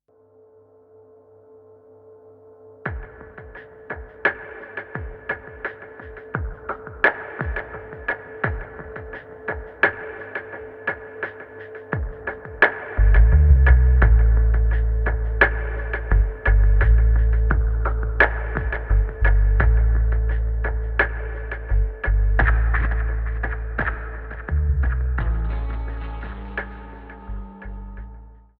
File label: Ringing Beat